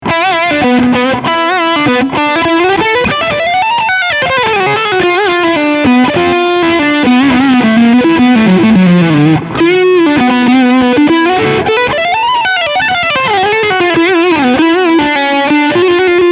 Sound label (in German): Leadsound Steg Pickup